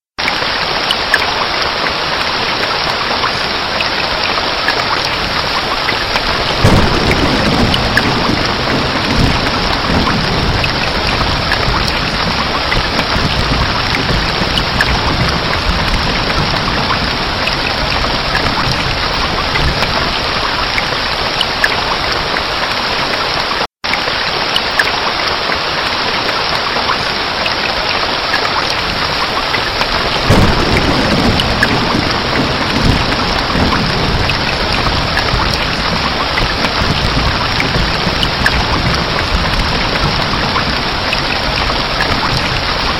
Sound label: sound rain